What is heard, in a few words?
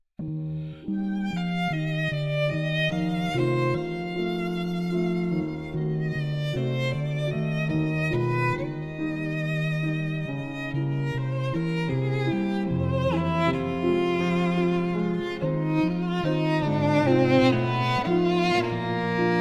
Tchaikovsky Melody, ringtones, Calm; Samsung Galaxy-Z-Fold-7